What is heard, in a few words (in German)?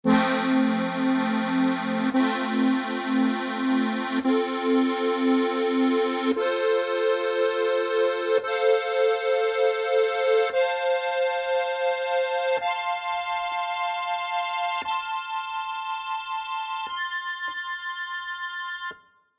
GCFB Akkoard, harmonika